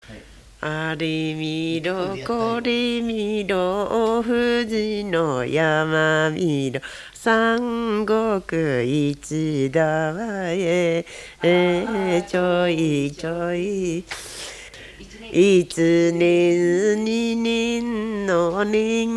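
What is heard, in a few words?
old lullaby; minyou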